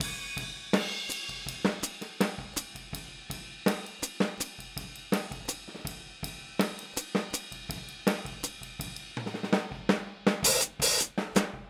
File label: Boogaloo Fill Hi-Hat, 82 BPM; Sonic Foundry; Drums from the Big Room The Mixes